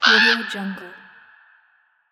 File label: crow